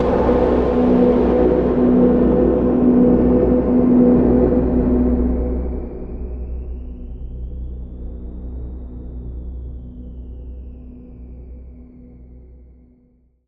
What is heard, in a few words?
Cinematic Adventure